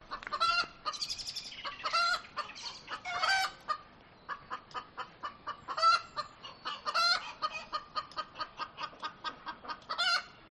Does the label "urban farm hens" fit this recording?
Yes